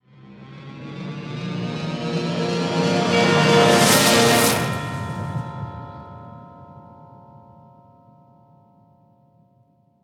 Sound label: Escape Sequence Music Transition Stinger; Valley